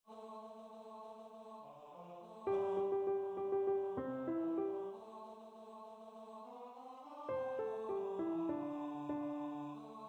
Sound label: Drunken Sailor B1 (practice, a capella)